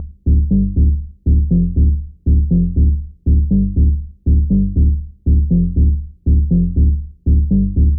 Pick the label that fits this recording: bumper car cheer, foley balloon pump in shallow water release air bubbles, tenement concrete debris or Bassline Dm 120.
Bassline Dm 120